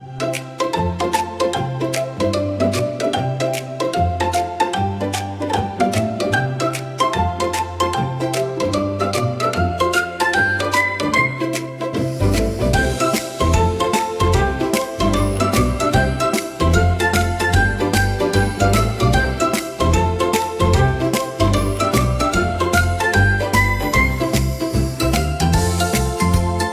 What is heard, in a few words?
funny game